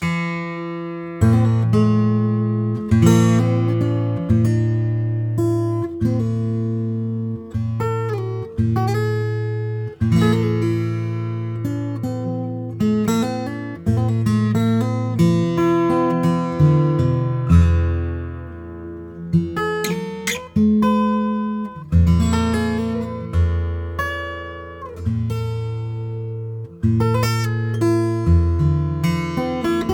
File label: Open Tuning, guitars